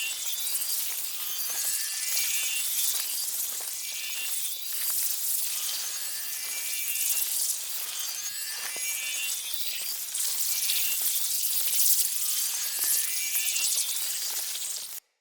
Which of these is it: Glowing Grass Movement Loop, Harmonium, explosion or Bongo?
Glowing Grass Movement Loop